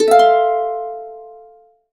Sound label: harp chords, F maj7; USB Soundscan vol.62; Complete Harps; AKAI